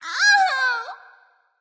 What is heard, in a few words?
NV Ouch; smite; awilix